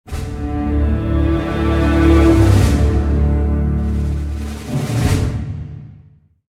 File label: Retreat Battle; heroes of might magic iii hd 2015 ios